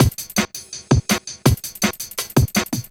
True Break; Jungle; Breaks; Blu Mar Ten - Jungle Jungle - 1989 to 1999 Samplepack

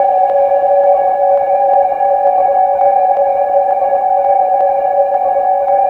Grey Pad; Jungle; Pads; Blu Mar Ten; Jungle - 1989 to 1999

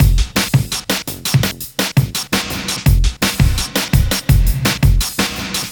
Shoes Break; Jungle; Breaks; Blu Mar Ten - Jungle Jungle - 1989 to 1999 Samplepack

